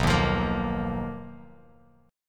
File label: B Major Sharp 11th B, Bass Standard; BM#11; strummed; JGuitar